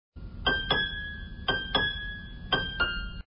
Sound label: power mid